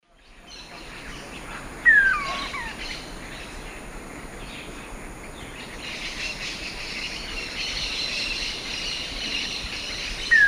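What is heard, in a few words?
Monasa nigrifrons, call juvenile; S. America; Eastern Lowlands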